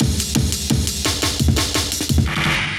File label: Rumble Fill, Loops, breaks; m8 breaks